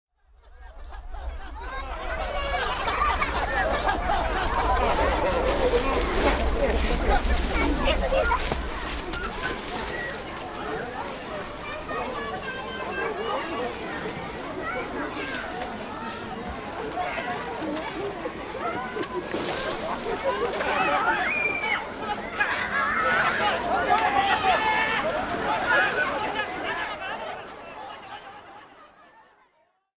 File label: toy